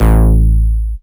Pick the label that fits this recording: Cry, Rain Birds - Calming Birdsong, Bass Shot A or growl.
Bass Shot A